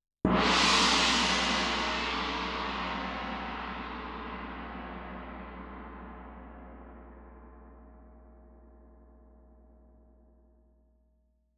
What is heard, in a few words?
Tam-Tam Close